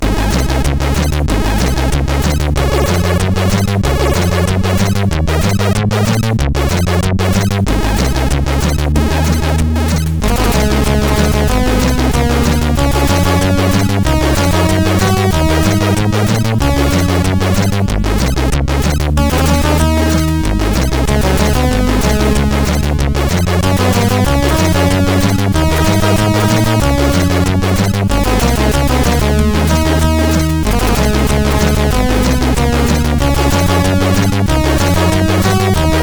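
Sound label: Rock n Roll, MOS 8580R5 SID chip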